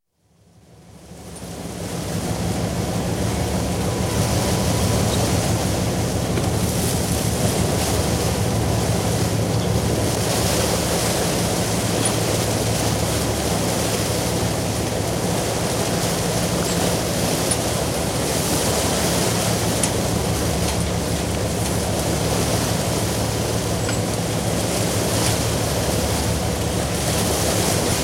Beam Fishing Trawler, atmosphere at sea (Recorded on deck); Boats; BBC